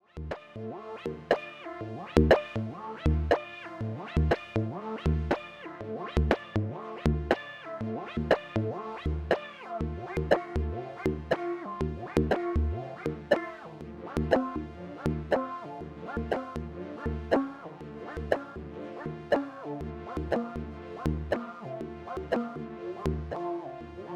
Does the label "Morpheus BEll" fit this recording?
Yes